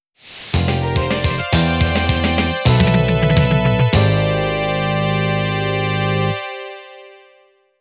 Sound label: Game Over Jingle; tokimeki memorial 2